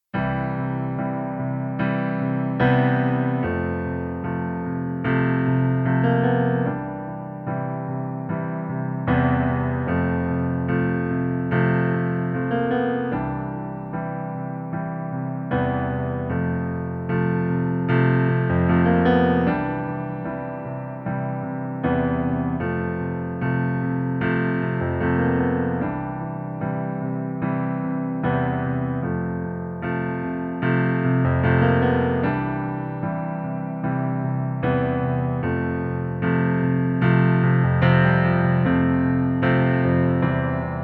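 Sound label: piano playback C major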